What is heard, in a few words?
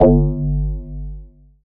BOWWWW G0, BASS; Loopmasters; Jack Sparrow; Deep Dubstep Progressions